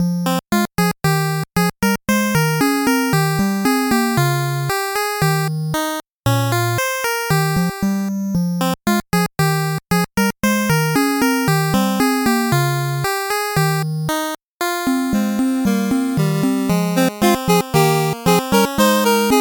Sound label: 8bit bgm normal